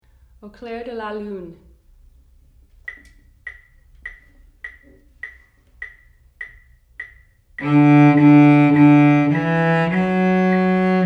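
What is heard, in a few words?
Cello Choir; Au Clair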